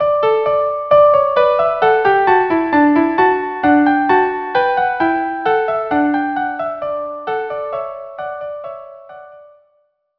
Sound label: violin; Heldenmusik La Vaillance